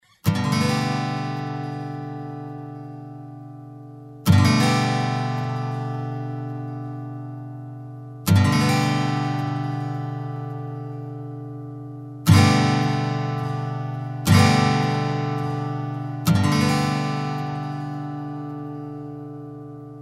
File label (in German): humanized Akkorde